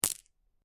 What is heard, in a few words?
Marble Impact With Several Marbles; Small rattle of impact from marbles or pebbles.; Miscellaneous Toy Sound Effects